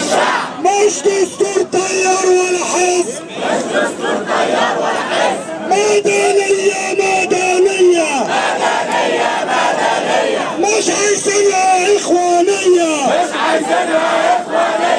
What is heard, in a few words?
more chants from Alexandria